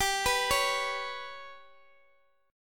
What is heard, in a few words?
G Major Flat 5th G down Guitar DADGAD